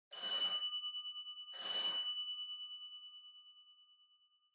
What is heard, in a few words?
Doorbell Mech Bell, Multi Far